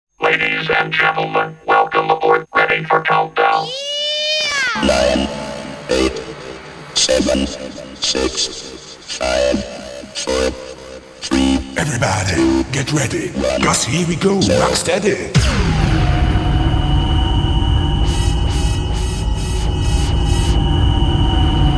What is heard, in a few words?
Jingle WELKOM ABOARD ROCKSTEADY long JINGLE; kermis; Sound Around